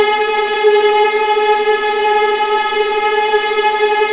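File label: VLA G2 TRM, viola tremolo, The Ultimate String Collection; East West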